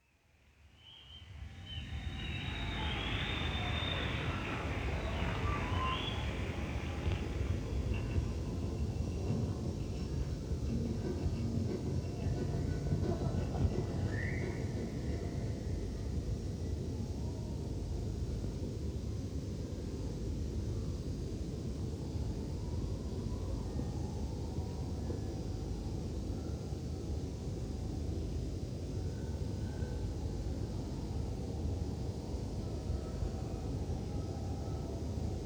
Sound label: Tuning & Soundcheck